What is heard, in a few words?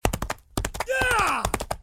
attack; Blind Gladiator; Torquatos